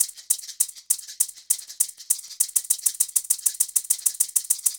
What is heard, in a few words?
Maraca 100, Percussion Elements, Abstract World Fusion